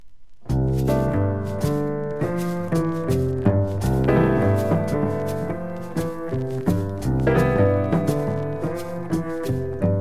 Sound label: percussion; Blue Note (us)